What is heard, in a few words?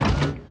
turret inventory pickup; factorio 2.0.55